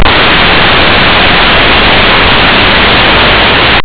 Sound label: Wilhelm Scream